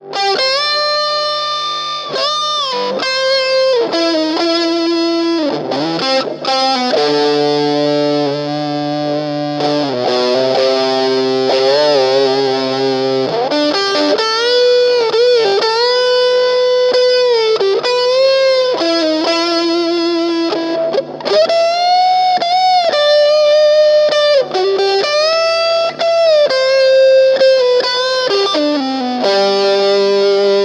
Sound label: lead; battersea